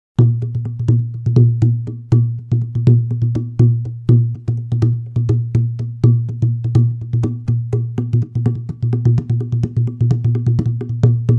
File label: Drum